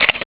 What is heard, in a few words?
Shutter; LG